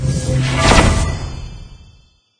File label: Enchant Best